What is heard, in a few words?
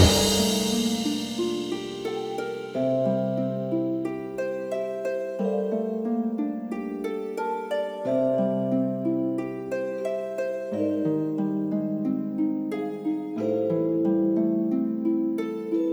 ENDING BGM; Giga Wrecker Alt.